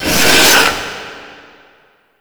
Breathe Out; Warframe; Stalker

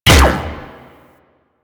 Shot; Warframe; Weapons; Corpus

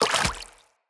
Root Spider Mother Step Water, Spiders

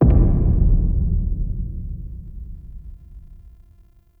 Sub Hit; Warframe; Shadowgrapher

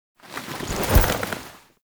Comfort Foley Sit

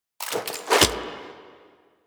Idle Gun Shoulder Impact; Proto Frame Idle Anims; Quincy

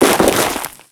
Crewman Land Ice, footsteps; Enemies; Warframe; Corpus

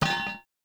Incense Burner Contact Impact

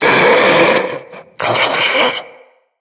Infested Ancient Idle; Enemies; Puss Ancient

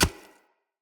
Short Sword Medium Impact Textile; Weapon Impacts; Player; Swords; Environment

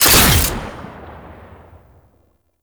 Shot; Fulmin Prime; Weapons

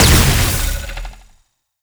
Wave Ability Proj By Explosion; Enemies; Abilities; Warframe; Duviri; Dax Knight

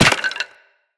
Operator Run Bone, Footsteps; Characters

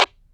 Enemy Static; Warframe; Lasrian; Battle Barks

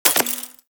Bullet Impact Debris Glass

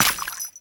Jester Steps Water, Footsteps; Foley; Warframe; Grineer Royal Jester

